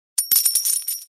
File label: notice